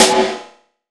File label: Snare, Gated Reverb; creative gate; musicradar